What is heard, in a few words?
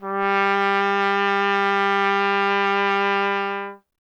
trumpet, G 0; Miroslav Vitous; Solo Instruments VOL-1